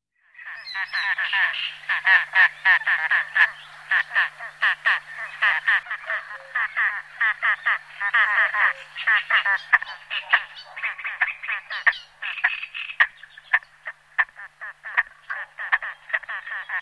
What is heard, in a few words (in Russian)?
p.cristatus, golosa podicipediformes